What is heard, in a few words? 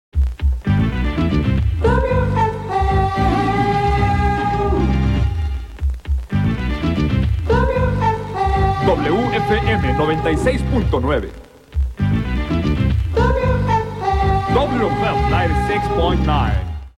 Jingle, radio